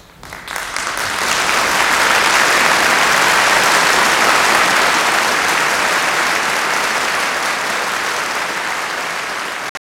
Applause; Beethoven; 교향곡 9번 합창; Archipel

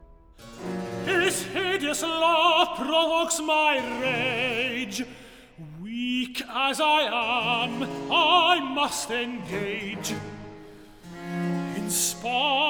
Recitative; Handel; Acis & galatea; His hideous love provokes my rage

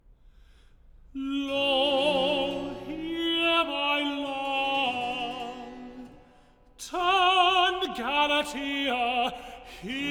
Recitative; Lo here my love; Handel; Acis & galatea

